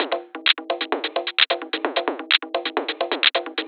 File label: Perc FX 130; Audacity; loops